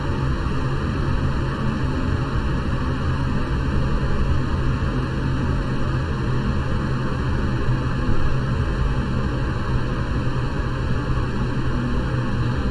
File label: wind